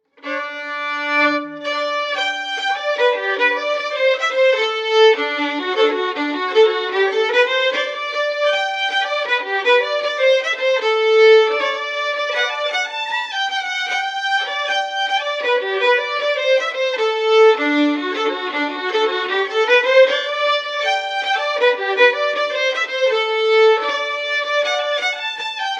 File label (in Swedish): schottis; Tommy-schottis